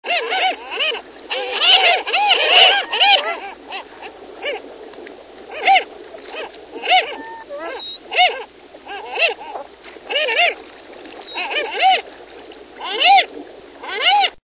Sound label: Harlequin Duck